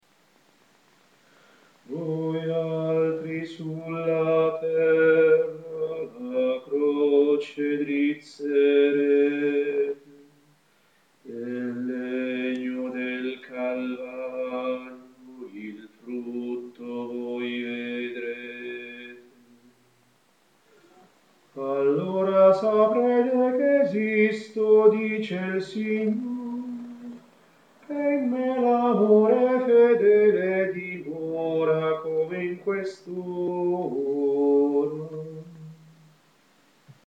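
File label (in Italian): allora sapete basso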